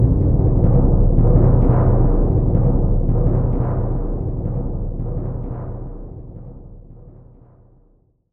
BD Roll; Roland M-OC1

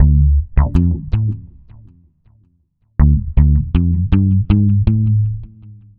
Dub Bass, Electric Bass; Dub Bass Strategies; Sonic Foundry (Sony Creative Software); Leo Cavallo Bass Taster

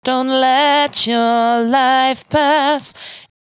92bpm Bb, Velvet Vocal; Zero-G

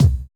kick, Roland MC-909

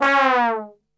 C#2 TRB, Brass Super Section; Best Service; 90 s Sample CDs

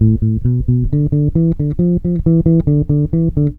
PUNK 134 A, Bass Grooves; USB Soundscan vol.30; AKAI

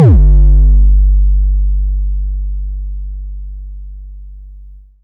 kick, Korg ER-1; Kit 3

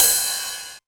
909 Ride, Drum Machines; DrumstationV1

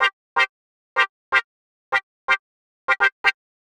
Rawstab C, Keys, Nu Jazz House, 125; PowerFX - Nu Jazz House

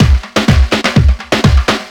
slow rolly, Deep House 125bpm

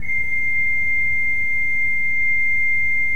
KOPPELFLUTE C6, Cathedral Organ; Propeller Island

